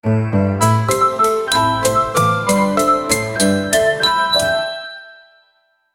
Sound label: 80s sitcom musical jingle